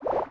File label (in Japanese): 足音; LcdDem SFX